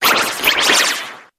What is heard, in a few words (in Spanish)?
Grito de Greedent